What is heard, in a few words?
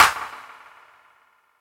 clap one shot d key, Royality free clap sound tuned to the D note. Loudest frequency: 2412Hz; percussion; single hits one shots